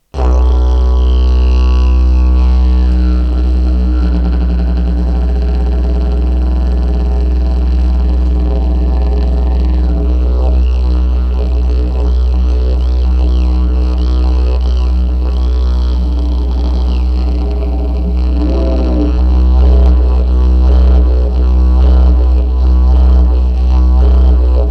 didgeridoo sicily

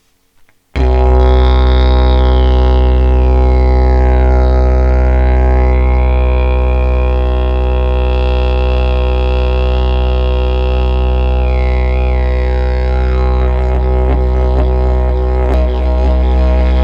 didge do 432

